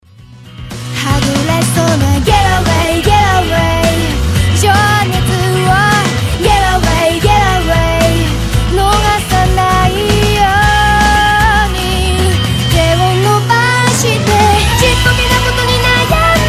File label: laugh away